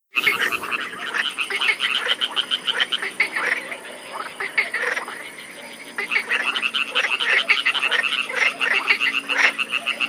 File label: FROGS